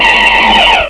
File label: Napper Base Die; Crystal Crazy Demo; Casady & Greene; Nasties; Shipnapper